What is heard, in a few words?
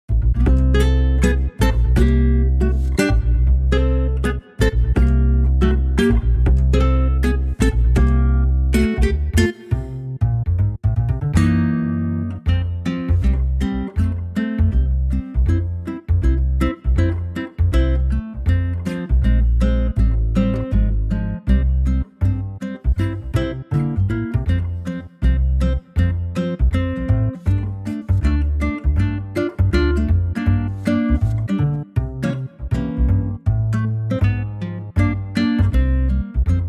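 F no solo, backing track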